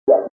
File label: Button Click; bobtherobber2